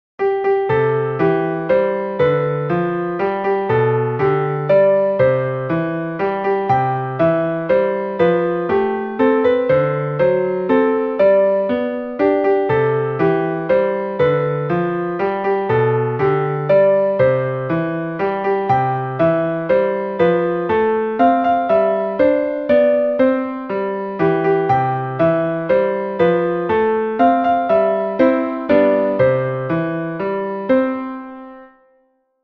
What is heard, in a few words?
HAPPY BIRTHDAY Piano